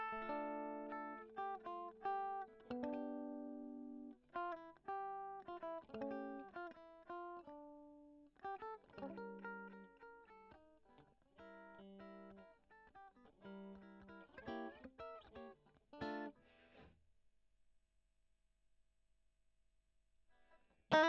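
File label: E.GTR, electric guitar; Gefell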